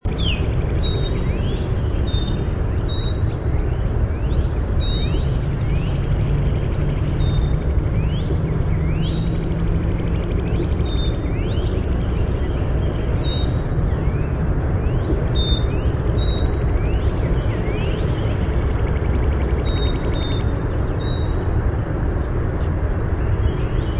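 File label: Carolina Chickadee song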